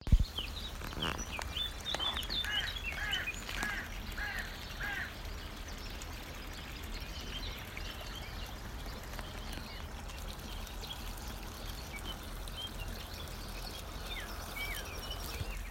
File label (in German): entspannung